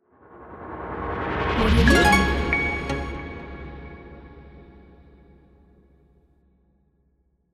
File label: thats all logo; thesieryj